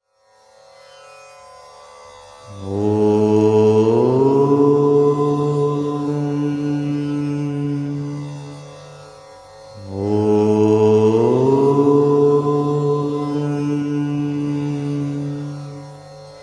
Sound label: om mantra ringtone